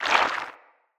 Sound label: Root Cave Mushroom Squish; Ambience; Props; Neathuns Root Cave